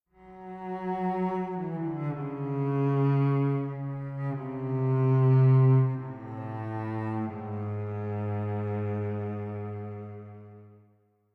Stinger Short, game soundtrack; Pillars of Eternity; Stinger Thaos Short Building Block 04

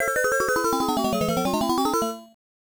Captive is Shot; Namco Classic Collection Vol. 1; namco nd 1 arcade

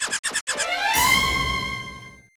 Sting New Area; Zubo Toobo; nds

